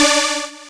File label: Synths D; vengeance essential club sounds-3